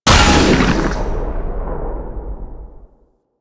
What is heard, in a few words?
Secondary Shot, Weapons Cannon; Kuva Zarr